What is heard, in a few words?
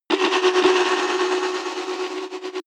drill synthetic